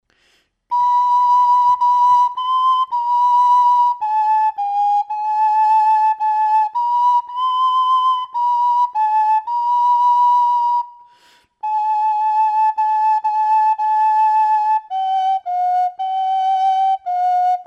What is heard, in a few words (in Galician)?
vibra dedo, Pito Galego; afinacion